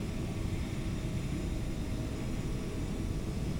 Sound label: Interior